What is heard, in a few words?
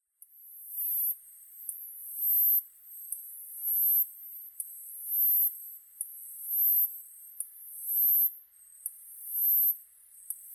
Hexacentrus japonicus; alt=490m; Rec.: EDIROL R-09; Mic.: Sound Professionals SP-TFB-2